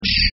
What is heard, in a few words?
open; hinged